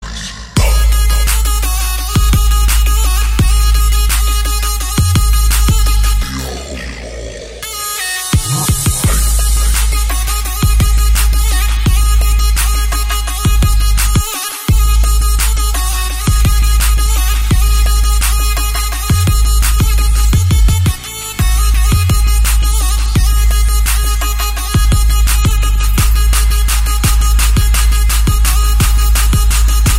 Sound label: Bass; Mercedes